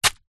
foley footstep single sandal concrete light dirt; zapsplat

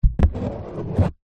office pen ball point draw empty card box

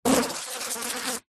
animals insects bee buzz against window

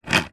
studio controller rotary knob turn

